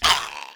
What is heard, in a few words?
damage